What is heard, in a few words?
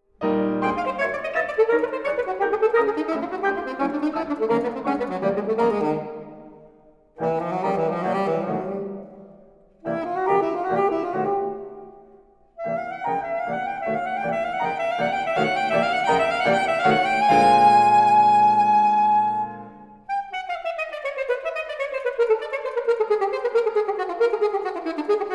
Saxophone Piano; Serenade